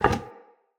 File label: Vase Medium Impact; Vases